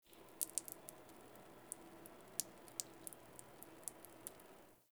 Exterior Water Drip; Warframe; Ambience; NineteenNinetyNine

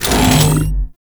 Devour Regurgitate; Warframe; Grendel; Grendel Deluxe

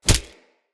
Unarmed Punch Impact Default

